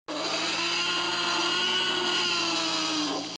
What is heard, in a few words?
T-rex Roar